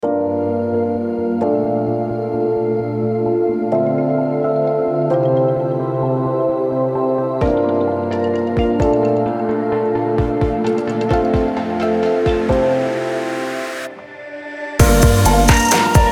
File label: ambient ambient music